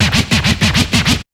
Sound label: SCRATCH; BEAT2000